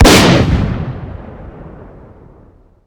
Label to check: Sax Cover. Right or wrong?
Wrong